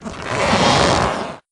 suspend stretch; mamemomi

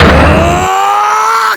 rage; kurogane

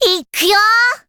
bounce; makoto

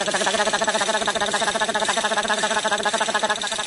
raygun anime

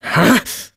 hrruughh; zacharias

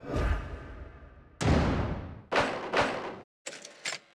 course correction; sherlockhd

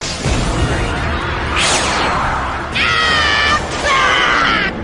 axe; luffy

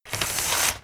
carriage open

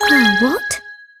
surprise; kira

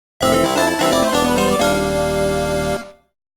jinglet

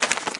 open; vera

